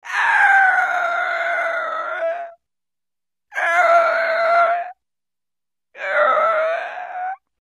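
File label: sharp brake by voice comically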